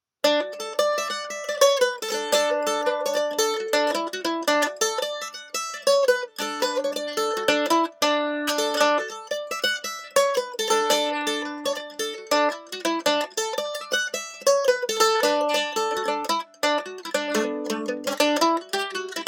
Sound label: Mandolin Pick Comparison